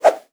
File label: Swoosh Wood Single; Thermostat; SOMFY; Sound design